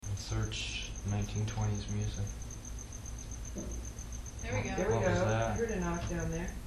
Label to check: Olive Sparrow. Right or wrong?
Wrong